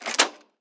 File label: unlock, lockpicking